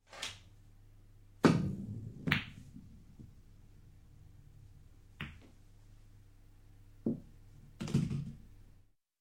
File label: Billiards Long Shot; Sound Ideas 2000 Series